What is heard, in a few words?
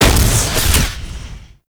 Flak Cannon Alt Fire; Warframe; UT Flak Cannon